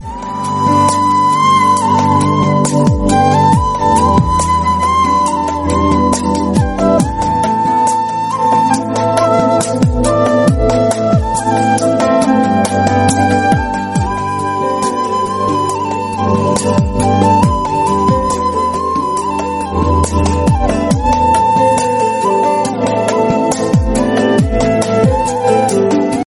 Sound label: flute bollywood ringtone